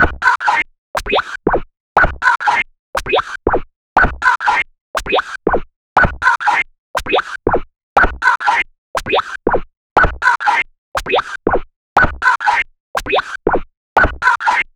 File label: scratches in tempo 120 bpm